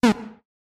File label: jump; roflnauts